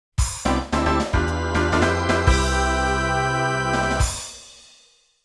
Goal in (2nd-4th); Mario Kart 64

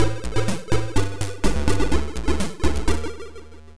Level Start; Dyter 07; atari st

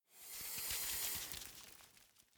Lizard Scuttle; The Town; 3D Environment

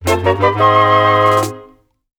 Item Get Fanfare; legend of zelda the links awakening 2019 switch

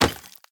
rotten food pickup

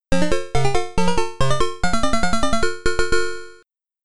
Stage Clear; Chack'n Pop; arcade

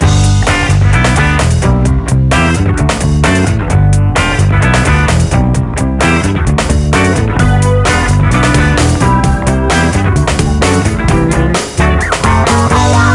Bug Smashing Music; Daring Game for Girls; NDS

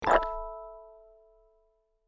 Cake Destroying; My Cute Commissar

